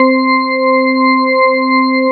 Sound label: C3 organ